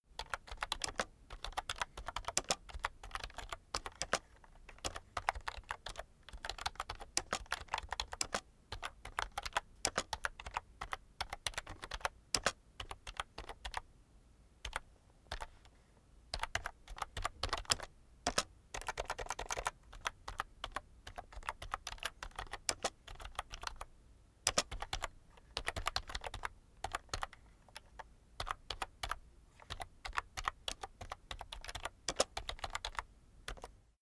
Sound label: keyboard external type sequence slow soft; kommunikation; computer